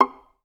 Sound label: VAS PIZ C5, Orchestra; Best Service; Peter Siedlaczek's Advanced Orchestra